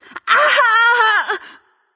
ouch light; smite; voicepack; hel

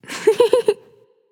laugh; smite; scylla

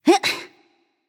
grunt lift; smite; izanami

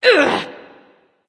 grunt hit; smite; skadi

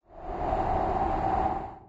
wind; smite; kukulkan

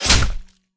melee impact; smite; odin

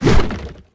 melee swing; argus; smite; hera

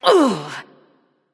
ouch; smite; freya

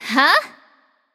nv confused; charybdis

